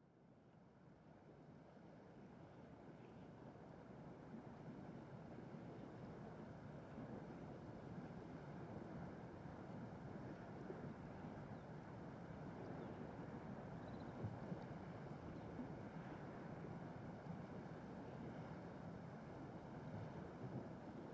refuge hut, The refuge hut nearby Jökulsá á Fjöllum; The Jökulsá á Fjöllum river